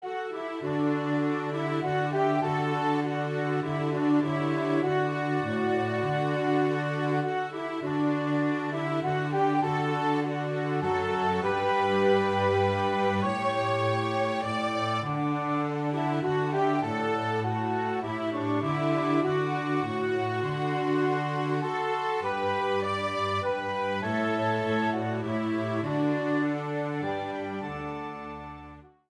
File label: Waltzes from Scotland